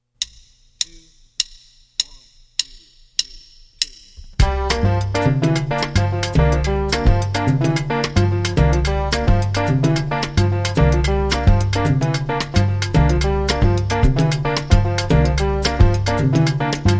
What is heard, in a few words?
with drums